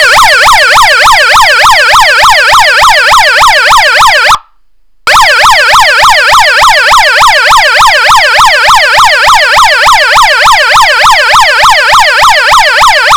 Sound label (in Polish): POLICJA, klaksony syrena